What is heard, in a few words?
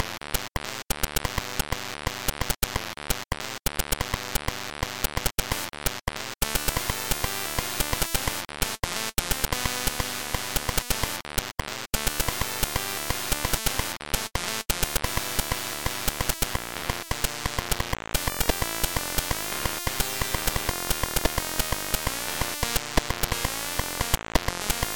1bit ish